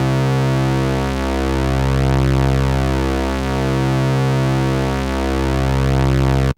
FAT PWM, DRONES & PADS

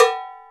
Cowbell Low ff; Digital Sound Factory Virtuoso

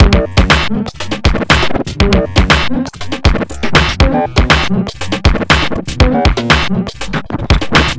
Drums Strange

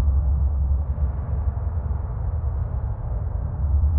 sparse soundscape samples; ReLooped

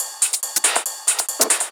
Loops 140 BPM Hihat Percussion Loops

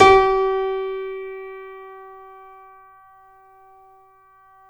C7 FF, The Ultimate Piano Collection; East West

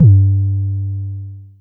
808 G2, Kicks; Drums; MBase 11 - 808

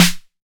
808 SD Tape Sat, Snare Drum; Goldbaby Tape808 & Tape909

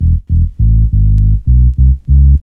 BS REGGAE, bass loop; AMG; Now CD-ROM (Roland)

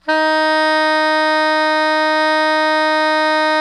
SOPRANO D#3, Saxophones; Greytsounds; Philip Wolfe David Crozier's Saxophones

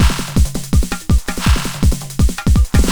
LOOP, Eurotech; Sounds Good

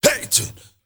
MALE RAVE, MALE NOISE, Heart of Africa

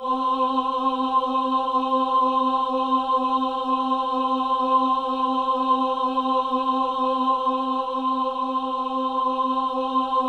C4, Synth Leads

